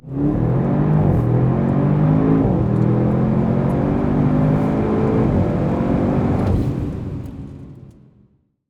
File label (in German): Audi RS7 Beschleunigung innen; my2020; rs7 sportback